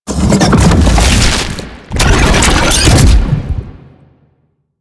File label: Octopede Lash Limbs Cast; Warframe; Man In The Wall